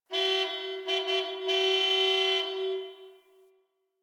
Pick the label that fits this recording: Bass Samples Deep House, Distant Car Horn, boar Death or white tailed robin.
Distant Car Horn